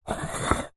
household ceramic bowl square twist rotate stone surface